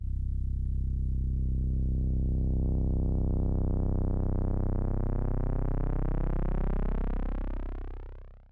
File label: cloaking, Synths; Notes